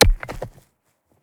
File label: Rocks Impact Hit Single Stone; Rocky Impacts